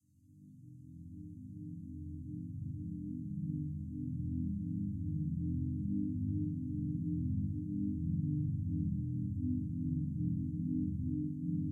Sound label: City Synth Ambience; gamerip; door to door